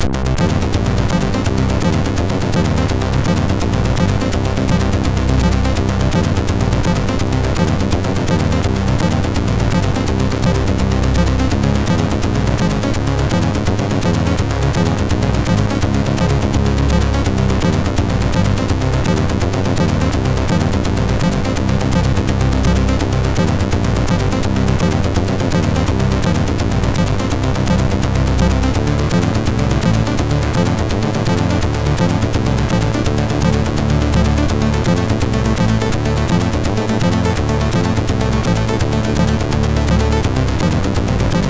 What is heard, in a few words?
Music, MOS6581R2